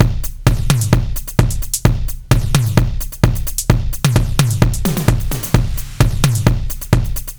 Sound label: Beat Core; Zero-G; Future Wave; Beats